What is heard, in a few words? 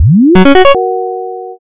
boot